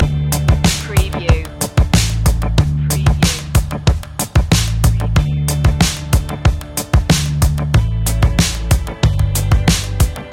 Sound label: cool feeling loop, Urban / RnB Smooth Beats; smooth urban beats and R&B grooves